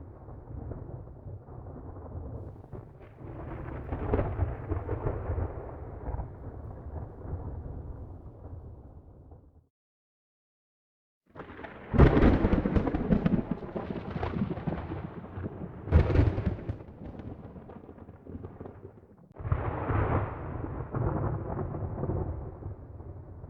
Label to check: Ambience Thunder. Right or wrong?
Right